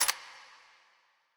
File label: Blaster Drop; smite; god cupid skin13